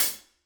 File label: room Cl Hat, drum